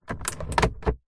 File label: vehicles suv centre console compartment heavy lid nissan patrol 2019